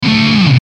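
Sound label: Musical Element, Drum Snare March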